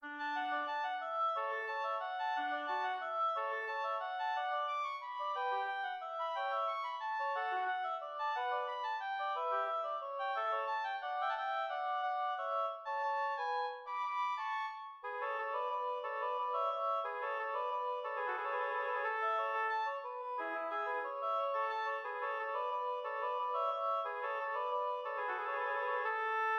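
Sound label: duetto in D major, 2 oboes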